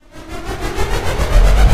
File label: fly away off; eiffelmedia; brick breaker